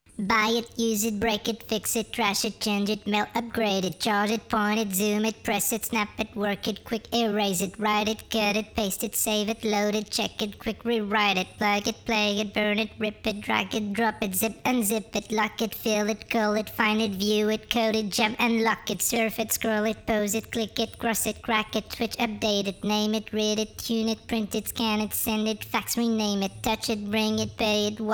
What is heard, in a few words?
voc fx; Multitrack